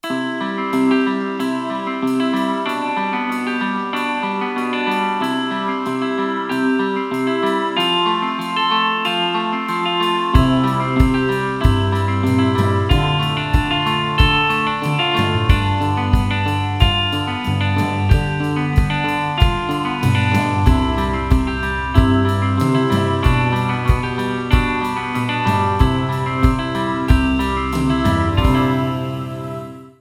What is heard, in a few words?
(no ac gtr)